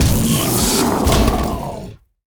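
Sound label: Mech Tyranno death; monster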